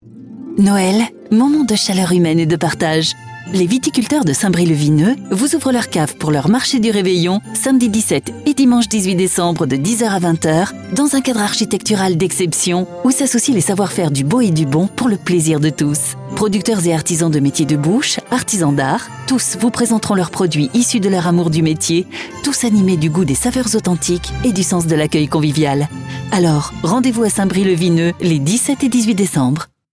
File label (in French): MARCHE